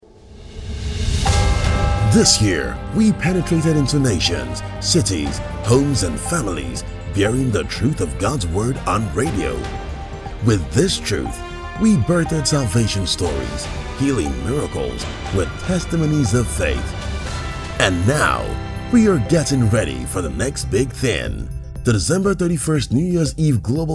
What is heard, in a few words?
JINGLE; NEW YEAR EVE